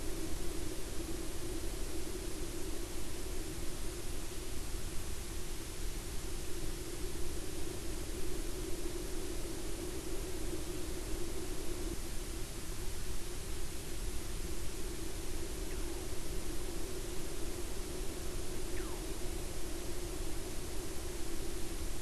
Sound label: WFR audio emfisis